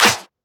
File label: Shifted Clap; FLPRO